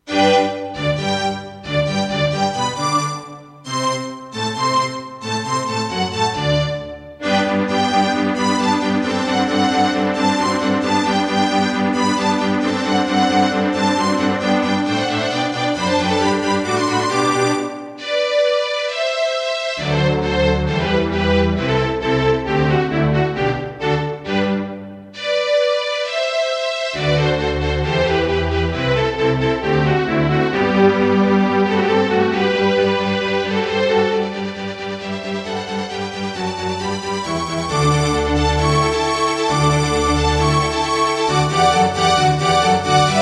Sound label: Hammond GM-1000; classical; Mozart; Eine Kleine Nachtmusik partial